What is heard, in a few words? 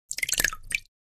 Liquid hands in water tiny movements